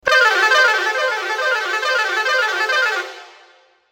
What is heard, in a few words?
tone high low; boitier de controle; 19 melodies; basuri 2.0